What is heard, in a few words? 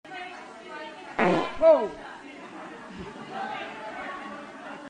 farts loud; biden; audio meme